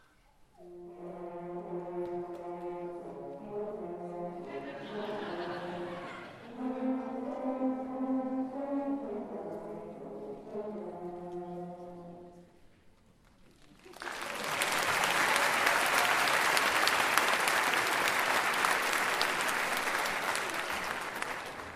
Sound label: horn feature beginner winter concert